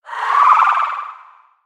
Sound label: attack